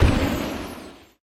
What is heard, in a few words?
GEMS Gem; Puzzle Chronicles; PS3; PSP; X360